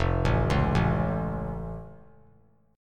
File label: F Augmented F; arpeggiated